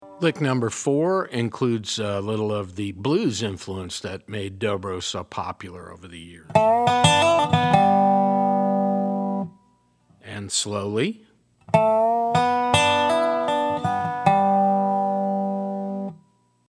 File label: resonator pedal, steel guitar